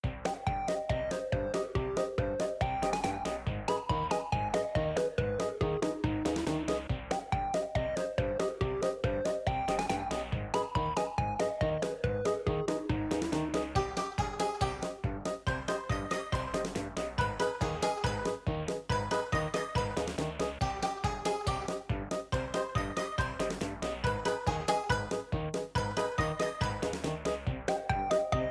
Ingame Music; Bucketz

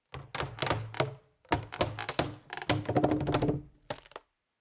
Exterior Wood Creak; The Town; 3D Environment Clip

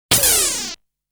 shooting sound

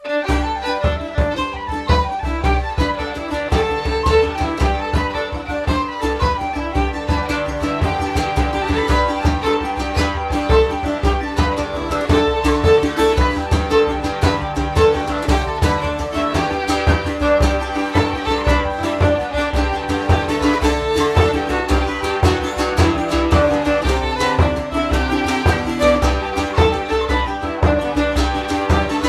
Orchestra Ambience; Assassin's Creed III

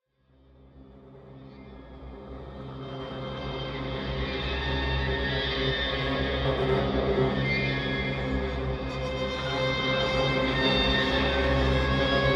Action Drone clusters

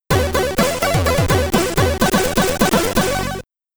Get Ready! (SID Stereo)

